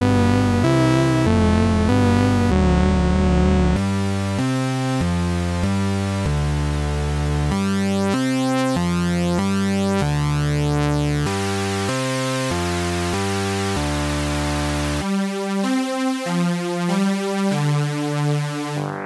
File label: Syne Leads